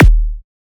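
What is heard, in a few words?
hardcore Kick D1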